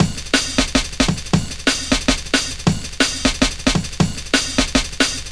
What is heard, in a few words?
Dope Break, Drum & Bass; Fluid Dynamics